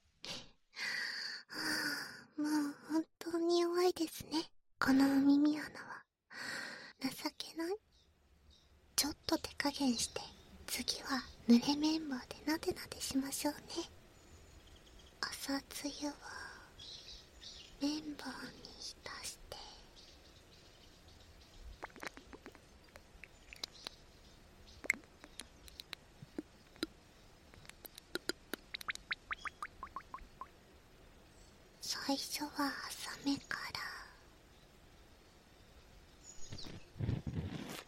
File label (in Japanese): 【左】濡れ綿棒, 耳かき